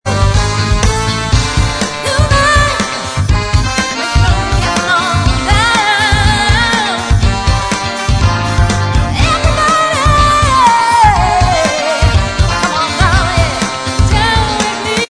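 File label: backing vocals, backing tracks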